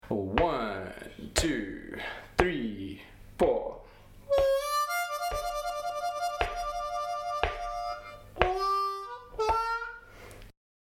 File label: Warble Lick, harmonica